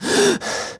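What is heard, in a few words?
Realistic Fatigue Voice, male; oblivion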